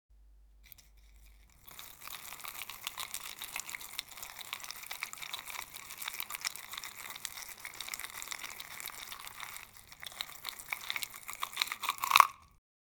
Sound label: mixing cake ingredients; baking